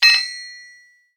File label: smithery